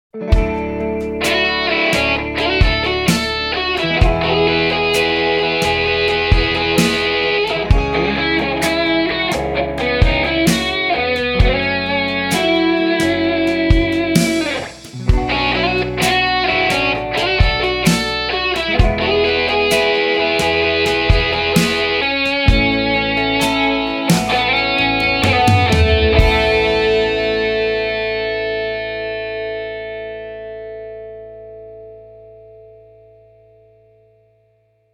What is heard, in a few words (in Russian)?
gorodskaya barnaya elektrogitara s korotkim zvukom